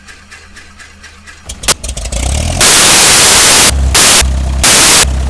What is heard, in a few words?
Cesna